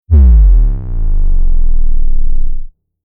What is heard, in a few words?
Sine Bass Down Low Frequency Drop From High Stinger; Descending; Sound Design; Tom Hutchings